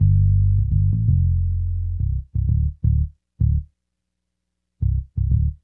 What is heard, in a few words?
Hip Hop and R&B Drumloops